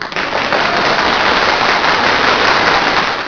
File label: clap